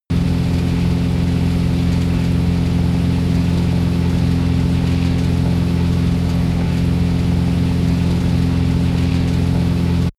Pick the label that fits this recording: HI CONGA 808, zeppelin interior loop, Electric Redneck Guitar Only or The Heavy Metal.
zeppelin interior loop